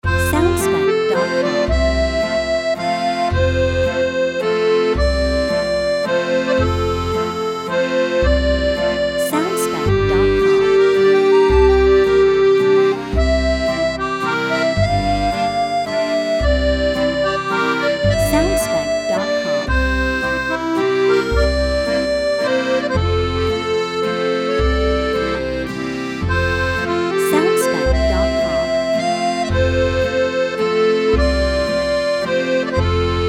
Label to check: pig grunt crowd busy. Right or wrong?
Wrong